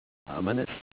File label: ominous